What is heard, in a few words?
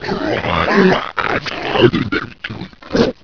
zombie idle